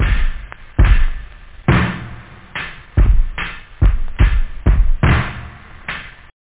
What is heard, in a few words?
burnin